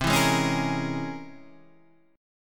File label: C 7th Sharp 5th Flat 9th C